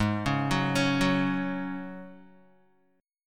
Ab 7th Suspended 4th Ab down Guitar

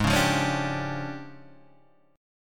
D Minor Major 7th Double Flat 5th, G sharp

